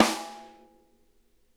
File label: SNARE rim; Sonic Reality; Interactive Drum Kits